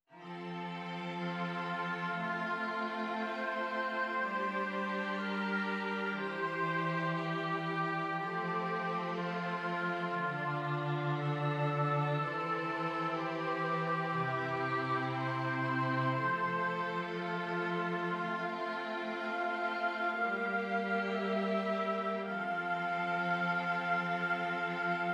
esercizi di contrappunto semplice A quattro voci; Ronge

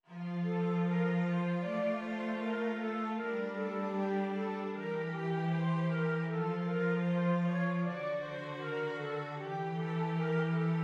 esercizi di contrappunto semplice A tre voci

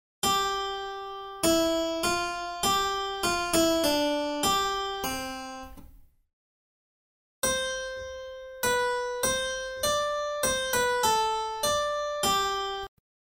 Tema e risposta

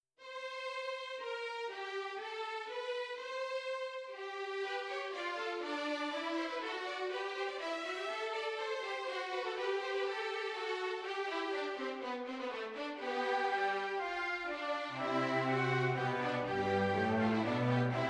Orchestrazione; Ronge; Gerd Prengel

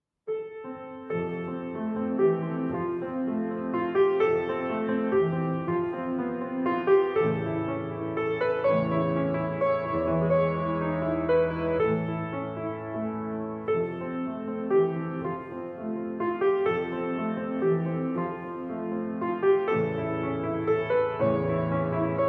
valzer in fa maggiore per pianoforte; Glaube Liebe und Hoffnung; Fede amore e speranza

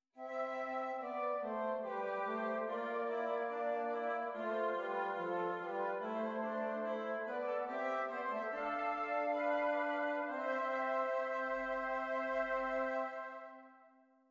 esercizi di doppio contrappunto nella decima

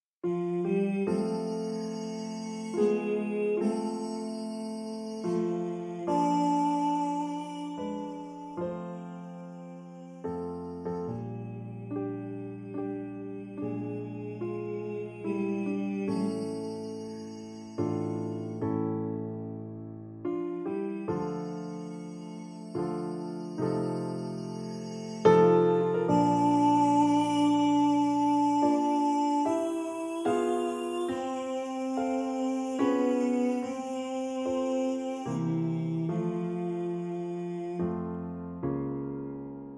Lied per voce e pianoforte; Kretschmar Friedr. Wilh.; Kann der Liebe suss Verlangen

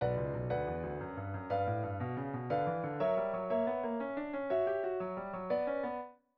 Pezzi strumentali vari

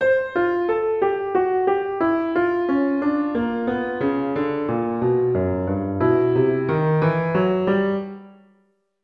Frammento per pianoforte

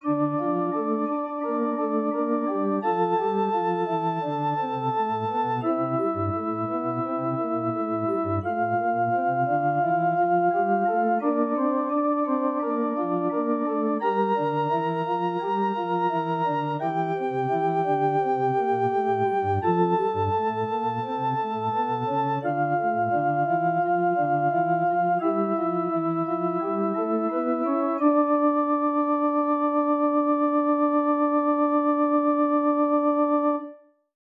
Esercizi di contrappunto a due voci; zur Dritte Gattung; Ronge pagina 66; Correzioni

